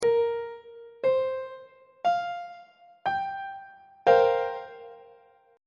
d double flat dominant 7th suspended 4th 3rd inversion on treble clef